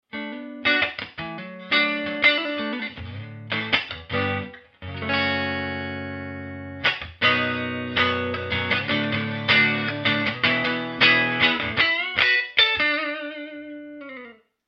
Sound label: clean